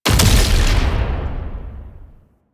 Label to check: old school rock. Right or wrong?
Wrong